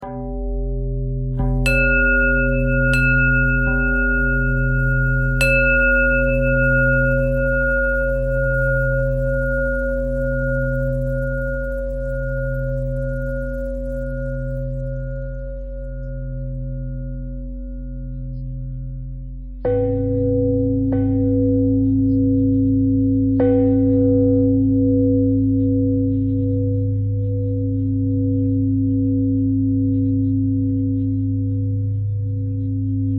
bell bell thread simple, bells

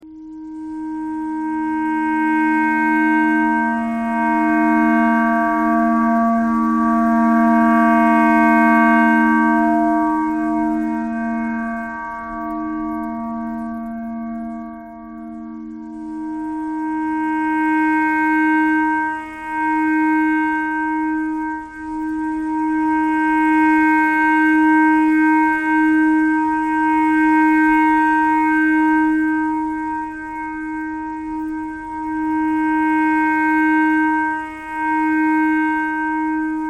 clarinet clarinet pentatonic

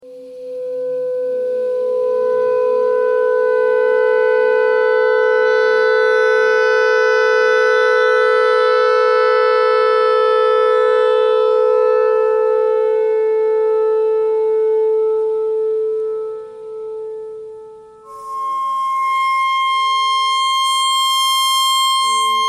clarinet notes e thread noise

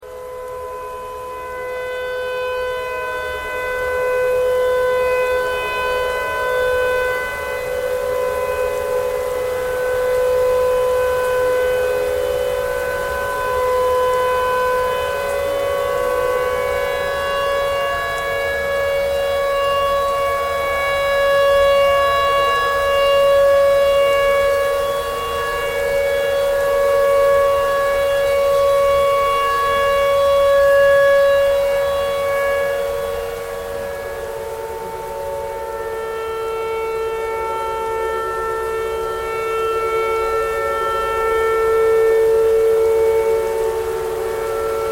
tornado siren tornado siren thread noise

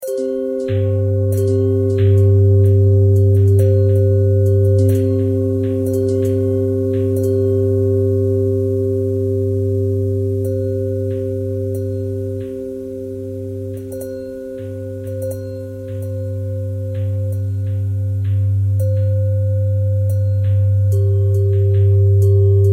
bell bell thread simple, bells

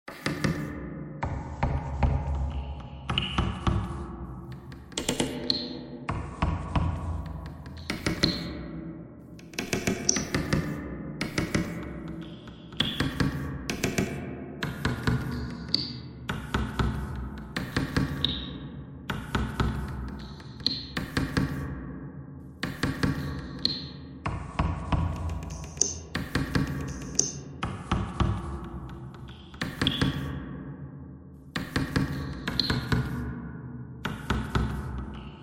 bagpipe thread noise; reverb

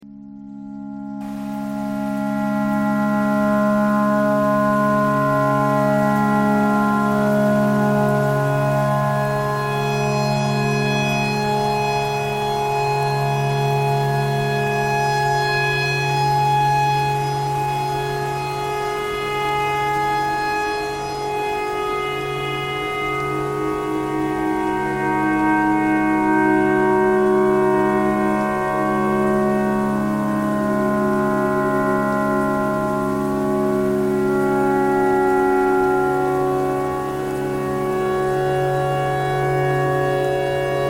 tornado siren clarinet thread simple

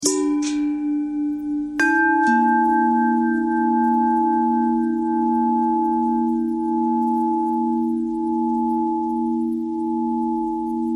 bell long bell thread noise, bells

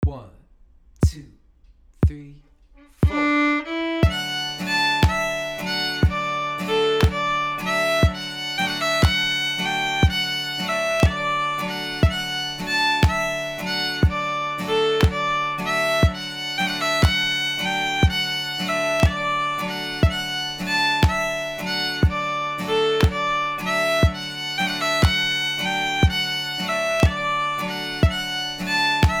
mary had a little lamb rolls variation; fiddle

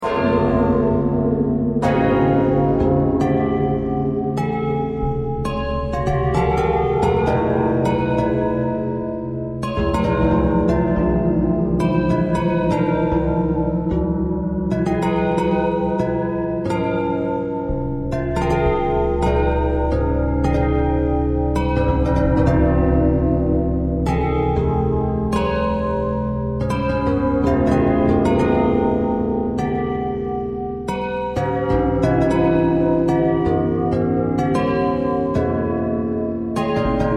bell twist

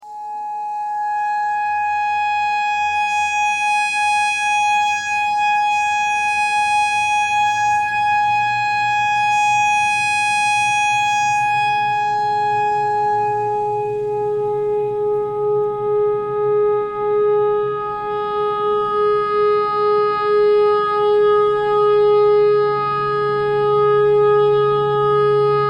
clarinet notes e tornado siren thread pentatonic

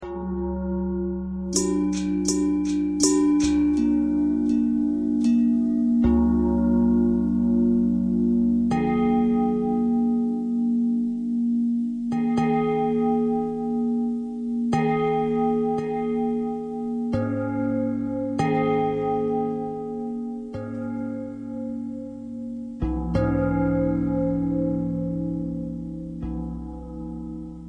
long bell bell thread pentatonic, bells

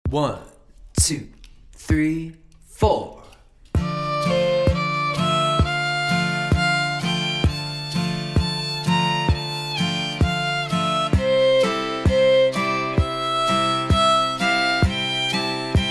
riff, fiddle

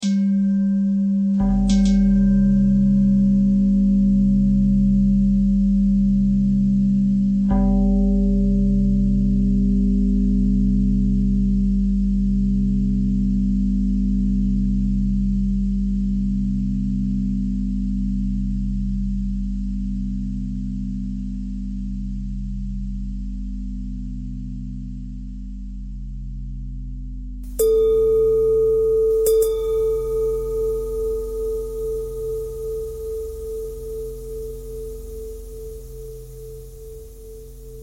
bell thread bend thread

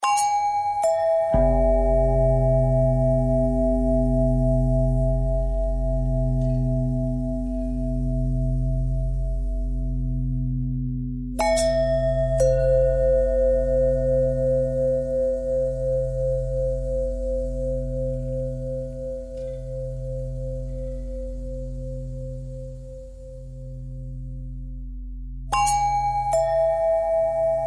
bell long bell threads; bells

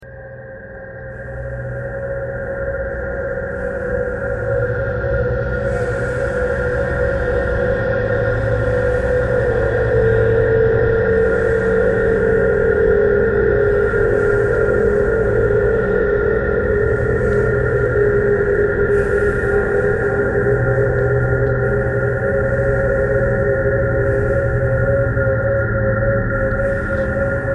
traffic core birds thread pentatonic

